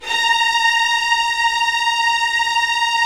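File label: string section; Roland - LA Composer vol. 1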